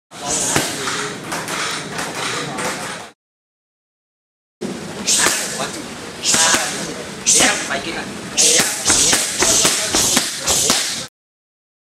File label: Muay Thai; ورزش موای تای